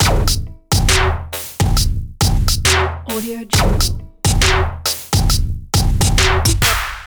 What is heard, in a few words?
68 bpm boombox knocks; soundmorph